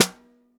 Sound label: SN1 Bottom, Snare; Ableton Foundation FreePacks; Snare Designer